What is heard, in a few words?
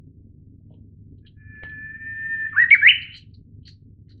uguisu